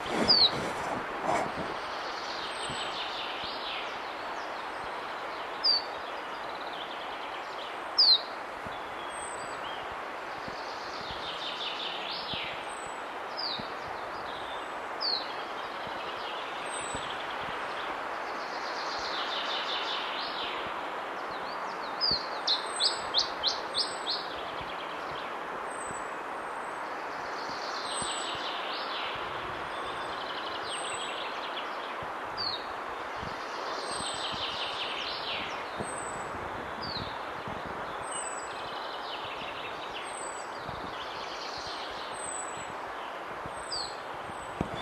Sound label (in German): iberischer zilpzalp